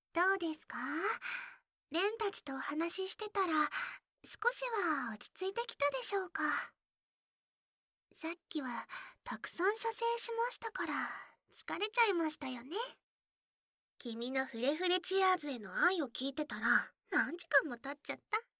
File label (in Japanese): 両耳舐め; アイドルたちがちんぽを手でシゴきながら、励まし両耳舐め♪